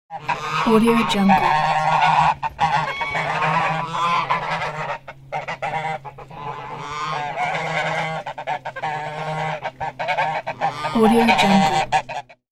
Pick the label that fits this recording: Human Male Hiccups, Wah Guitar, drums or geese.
geese